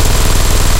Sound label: loop mg42 origin, guns tanks; War Thunder